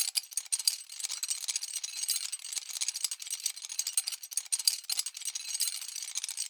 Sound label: Metallic Gear Loop; Survival Game Sounds - Lite Edition